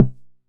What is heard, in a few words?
Snare 909